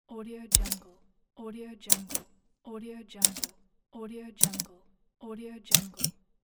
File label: typewriter; volkovsound